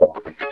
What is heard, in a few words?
Wah Scale; Mo' Phatt; Digital Sound Factory Mo' Phatt